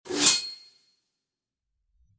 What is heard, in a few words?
sword